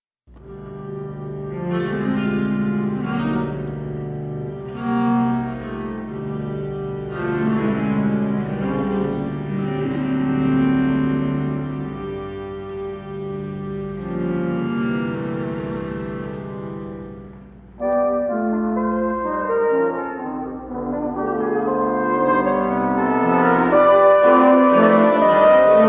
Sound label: Prelude for Two Consorts; david loeb